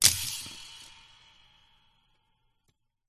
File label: ancient game metal spring release hit gear open slide texture